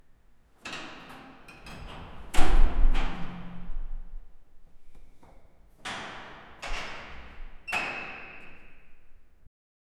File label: prison cell door is unloc